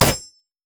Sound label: crit attack; LoL; akali